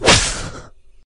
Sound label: sword slice